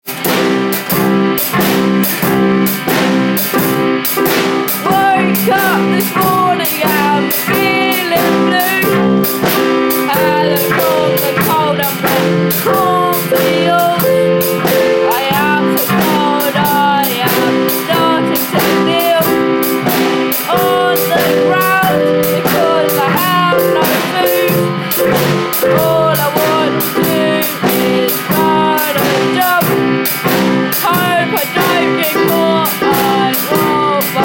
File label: blues piece